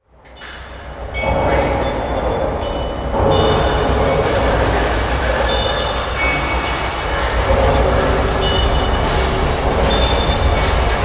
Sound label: bottles and bridges field recording